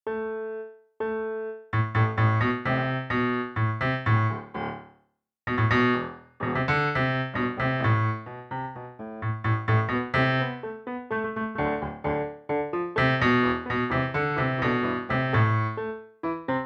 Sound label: FOLK Melody; Kiume